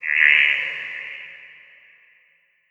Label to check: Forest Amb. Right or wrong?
Right